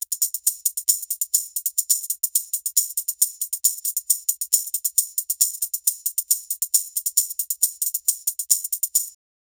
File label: tambourine shuffle loop, 136 bpm; SFX Engine